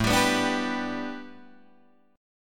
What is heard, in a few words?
A 7th Suspended 2nd Suspended 4th Guitar Drop D; A7sus2sus4 strummed